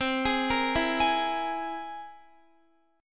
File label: C Augmented 7th, Guitar; arpeggiated; JGuitar